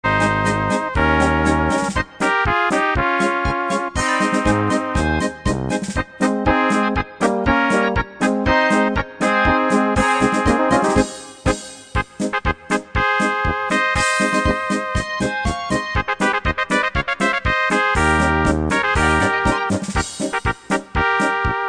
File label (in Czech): Polka